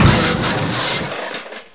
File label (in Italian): Crash